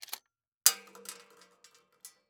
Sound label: Weapon Bullet Shell 12 Gauge Eject Shells On Metal Surface; AdobeCC